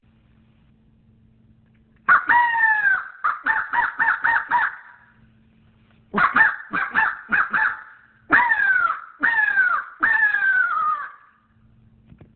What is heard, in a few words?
Crow sound; Crow sound clip